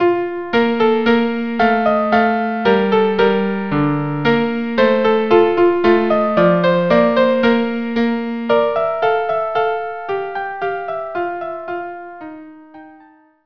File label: larghetto; mascitti